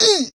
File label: Scream